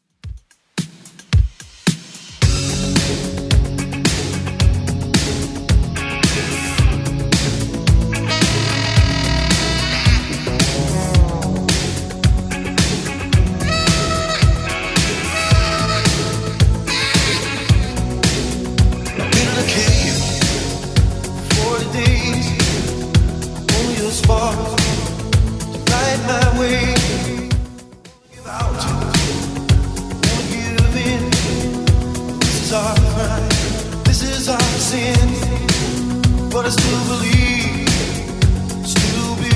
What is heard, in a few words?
80s Redrum 110bpm